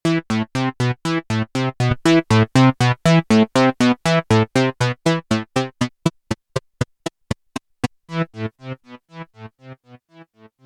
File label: EHX Pico Attack Decay Synthesizer